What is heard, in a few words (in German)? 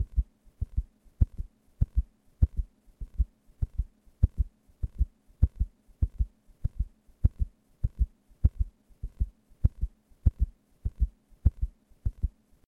herzschlag puls sehr hoch; salami sound; herzwissen grundschule materialien